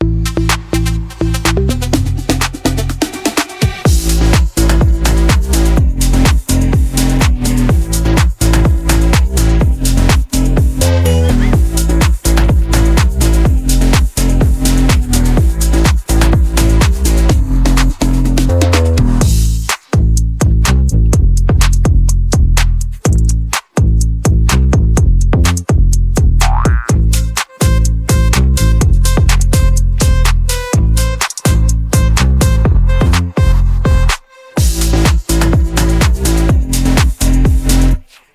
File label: instrumental